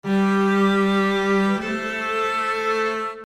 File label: V VI alto bass